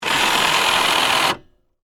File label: Winch Lower Motor, Industry, Machinery; Machines